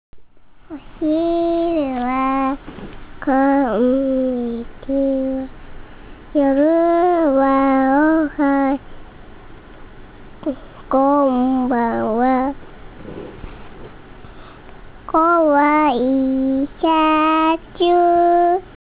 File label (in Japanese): 歌う娘